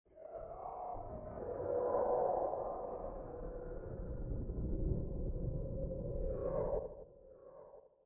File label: moan; STALZONE; minecraft